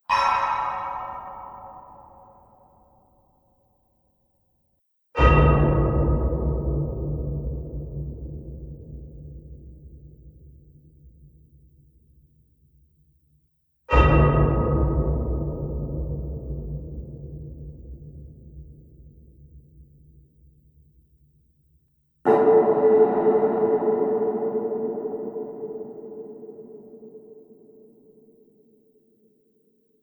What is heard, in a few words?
4X Metal Bangs; Miscellaneous Impact Sound Effects